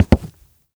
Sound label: FOOTSTEP Leather Wood Hollow Walk; mono; Don't Be Afraid The First Toy